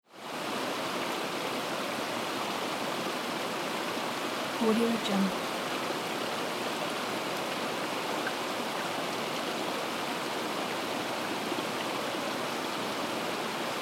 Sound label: forest stream small waterfall